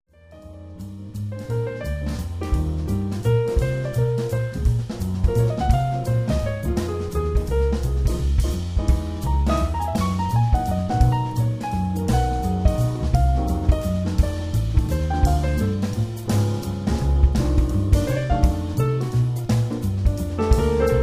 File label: Jazz Standards